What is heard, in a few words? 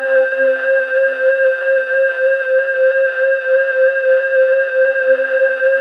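CALLIOPE PAD; Pads; Distored Reality 1&2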